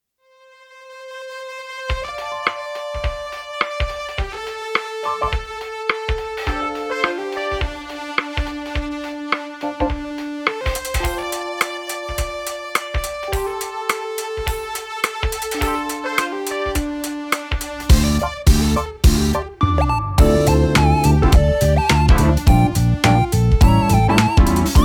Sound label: Lofi instrumental